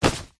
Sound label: foley clothes sports shorts pick up from carpet; zapsplat